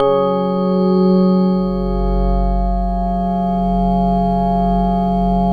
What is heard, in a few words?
Prophet VS, F#1, Vintage Keyboards; Big Fish Audio - First Call Vintage Keyboards